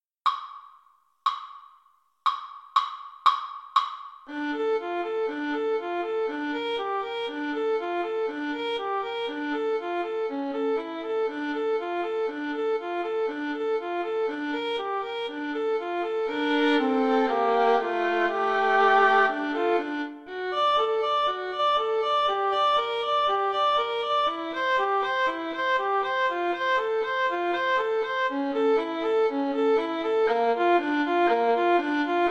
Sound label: VLN, Violin Duet